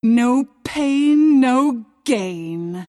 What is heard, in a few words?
pain level up; Queen of Pain